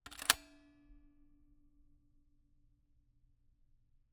Camera, Kodak EK2 Instant, polaroid, large, plastic, springs, release; Eiravaein Works; Vaeyan IV